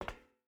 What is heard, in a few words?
footstep hide metal walk; Rust